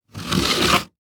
Foley Footstep High Heels Scrape On Hollow Wood Surface; Foley Footsteps